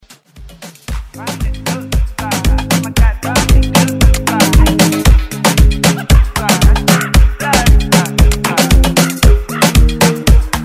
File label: Afro Moombah Redrum